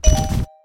chest pickup

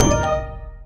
death screen

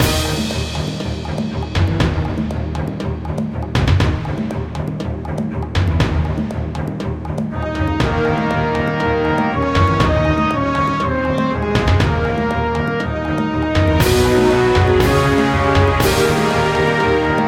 music

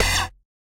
attack necro

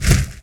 attack mage

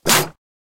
attack beast

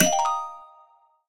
armor upgrade

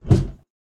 attack tank

